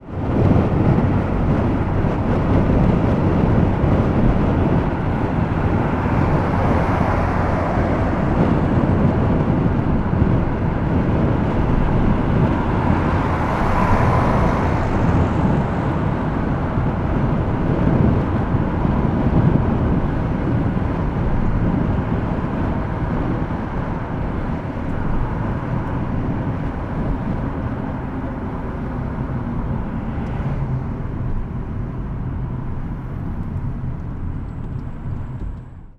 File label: transport car motorway inside window open slight air cars pass slow; zapsplat